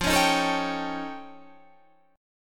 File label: F Augmented 9th F, chord